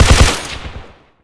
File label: polycraft 3 shot